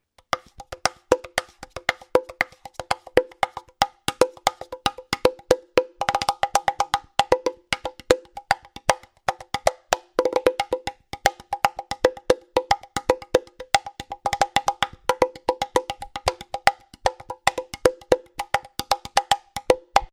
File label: wooden bongo